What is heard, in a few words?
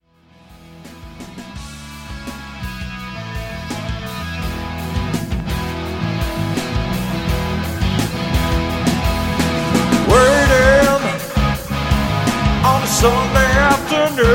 Cover Band; Omaha